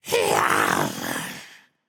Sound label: growl; smite; xingtian